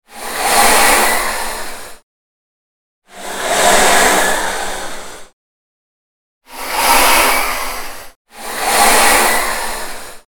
souffle

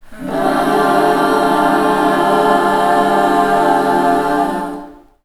accord vocal

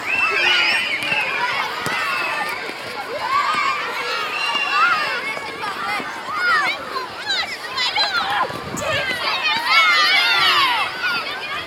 jeux d enfants

